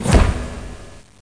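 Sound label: flash bomb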